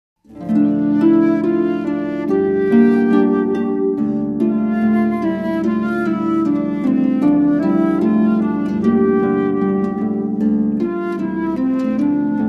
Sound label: jewish wedding ceremony music; Star Talent Ceremony Ensembles play a variety of Hebrew Songs for a Jewish Wedding Ceremon